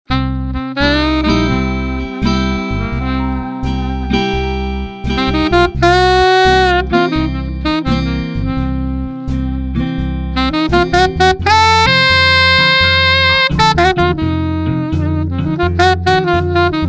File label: heptatonic; blues